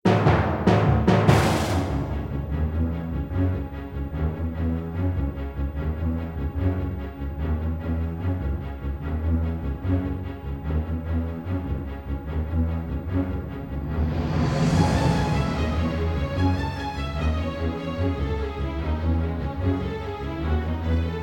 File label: Orchestral Tension; D.I.R.T.: Origin of the Species